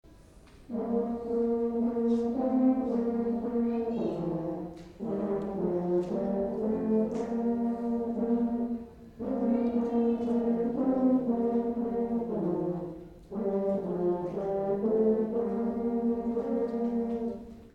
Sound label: Horns